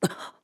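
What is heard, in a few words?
Hurt, voice; BOTW; Breath of the Wild; Link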